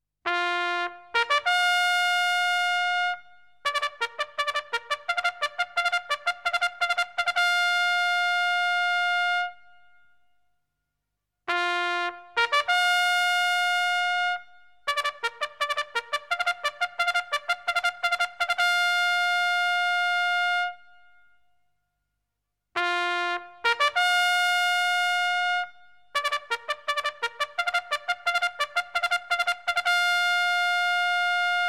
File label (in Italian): suonerie tromba militare